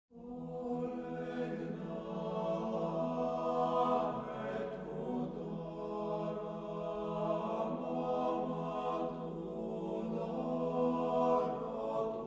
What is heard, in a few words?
Boys' Choir; Folk Impressions; Polegnala E Tudora